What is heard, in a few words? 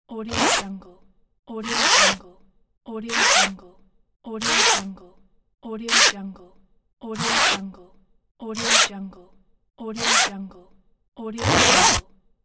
zipper